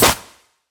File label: Impact Flesh; smite; raijin